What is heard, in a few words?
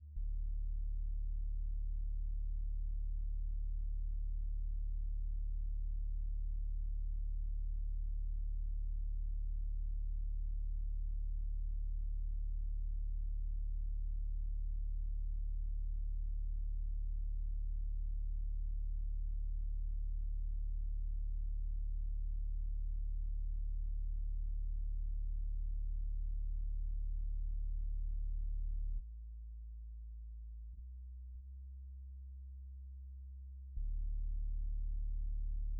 notes u spad solo